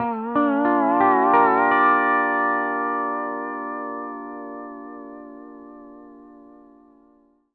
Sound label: PD LOOPS 140, Whole Lotta Country